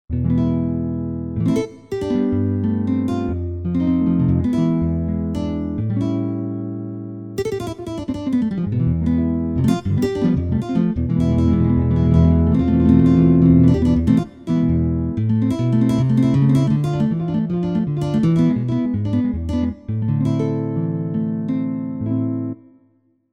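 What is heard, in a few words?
Guitar Flamenco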